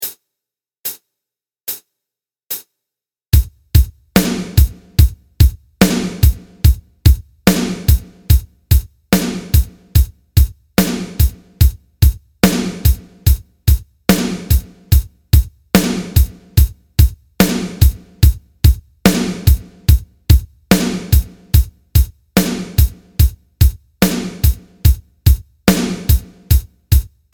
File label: Drums 145; Drum Part; Rock On!